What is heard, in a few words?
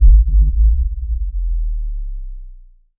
dark trap impact deep low; reverse swell